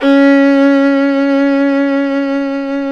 FIDDLE NOTES, C#4, R

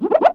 Running, Classic SFX, cartoon; musicradar; cartoon caper

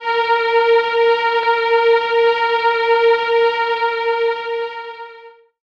a#3, Violin, MIRAGE; Retro Sampler; Cellos + Violin

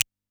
SNAP, PERC

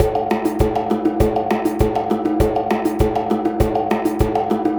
Cave Ceremony, percussion

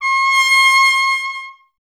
TP C; Miroslav Vitous - Solo Instruments VOL-1; Trumpet

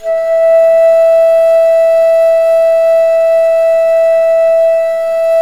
BASS E, bass flute; USB Soundscan vol.23

